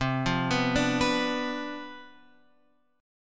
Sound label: C Major 7th Suspended 2nd Suspended 4th C, chord; CM7sus2sus4 Chord {8 8 9 7 X 7} arpeggiated